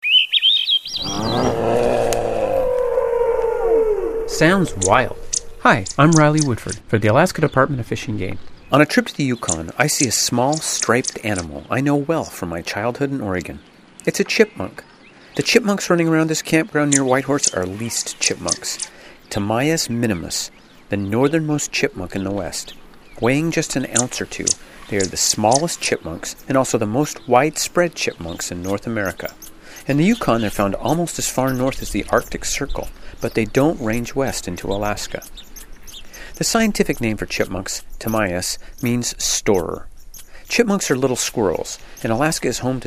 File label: chipmunks